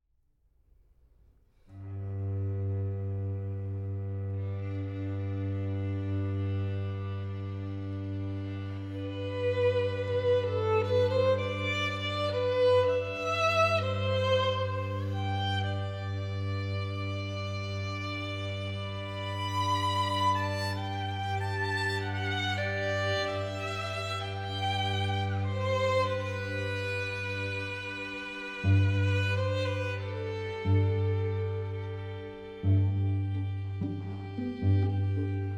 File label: Springtime Garden, string quartet